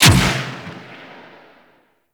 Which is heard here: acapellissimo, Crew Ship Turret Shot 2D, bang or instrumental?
Crew Ship Turret Shot 2D